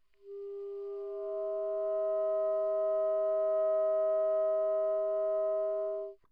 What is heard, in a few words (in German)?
zweiklang, multiphonic